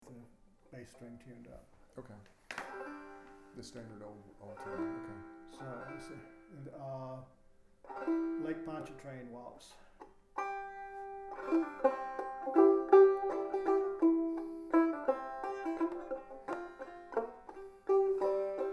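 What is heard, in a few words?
Banjo, field recording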